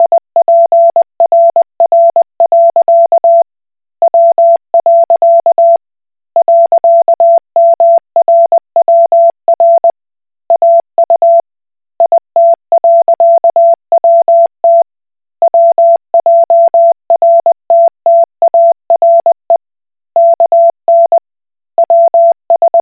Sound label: Koch Morse